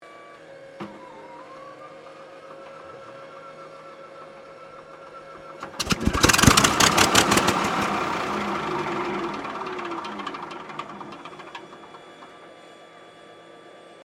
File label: AIRCRAFT P-40 KITTYHAWK STARTUP MISS-FIRE; P-40 Kittyhawk / Warhawk / Tomahawk; Airplanes